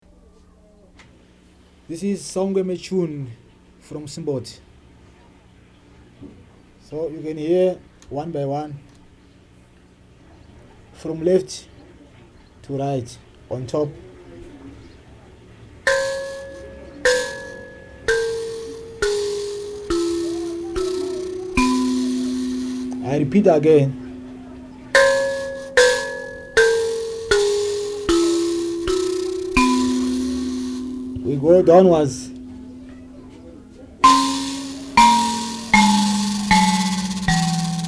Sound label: Saungweme Tuning, mbira